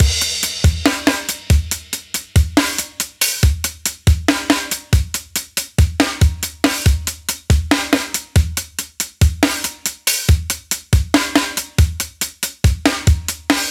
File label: Astro Add A, dubstep